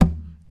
TAP, FLAMENCO Guitars; Spectrasonics; Hans Zimmer Guitars VOL-2

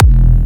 Jump Kicks, Bassdrums

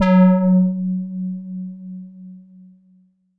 Wonky f#4, Korg Poly-800

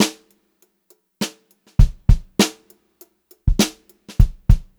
VAR, Funk-Groove Drumloops

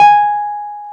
PNO, Wurly; Roland LCDP10 Keys of the 60s and 70s 2

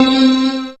synth, c, Top End Tinklers

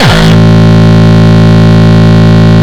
Longkick, kicks

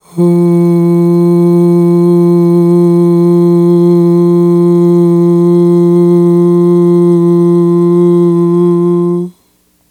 AHHH, Vocal; Polyend

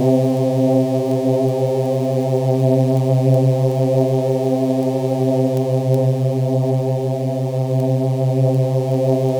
C3, Synth Leads

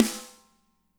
MED SNR; Spectrasonics - Burning Grooves CD2

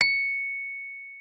MKFF C#7, RHODES; Xsample VOL-1

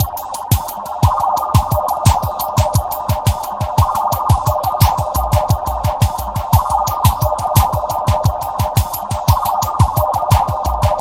FRACTAL DUB; FRENETIC FRACTAL GROOVES

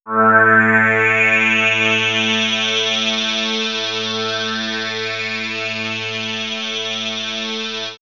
Swirls Phaser, pads; X-Static Goldmine

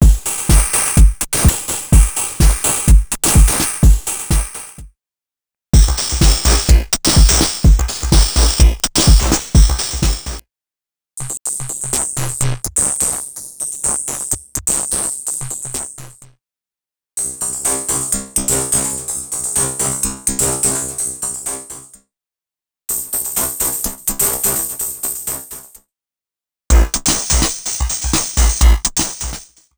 Industrielle 126bpm; X-Static Goldmine 5

